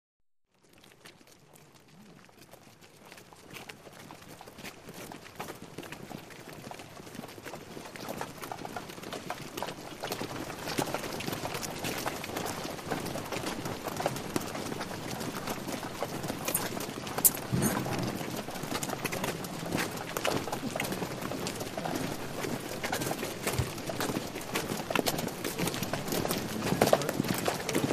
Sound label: Soldiers Marching